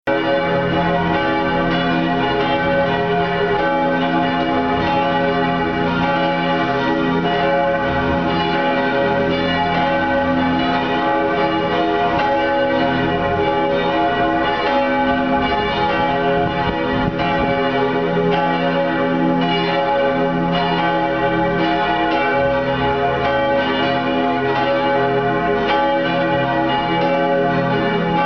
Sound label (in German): Glocken